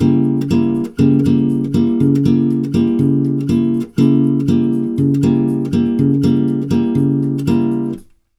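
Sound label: 120 guitar G diminished, brazil bossa; USB Soundscan; AKAI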